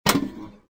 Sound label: open, metal door; movers; doors; Soldier Of Fortune II Double Helix; XBOX